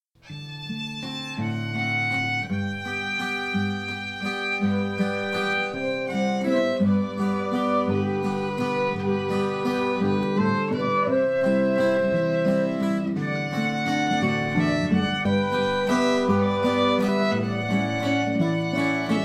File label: instrumental